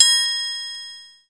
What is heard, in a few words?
Sound Triangle; Play&Learn